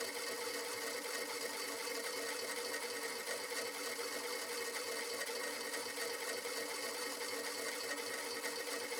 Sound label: spinner wheel spin loop; Rust